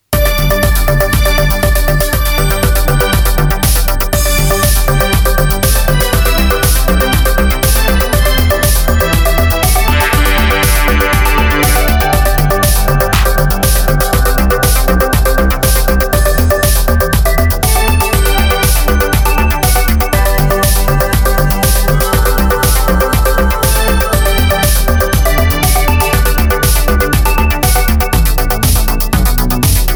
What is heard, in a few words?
winter marimba; ivo mikulic